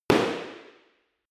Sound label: impact hard indoors short